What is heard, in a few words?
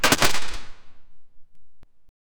mechanical clanking